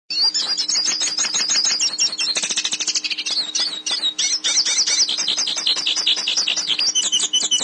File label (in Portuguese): Cantos de Pintassilgo